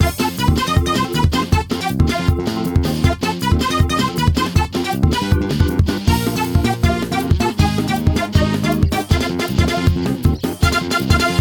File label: music fast; The Loud House - Extreme Cardboard Racing; NextlevelGames